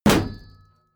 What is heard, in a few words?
sword hit shield ring